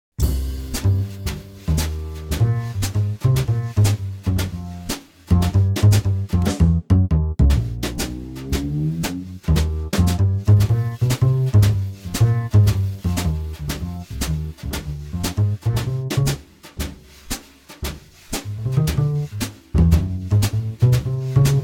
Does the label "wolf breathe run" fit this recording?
No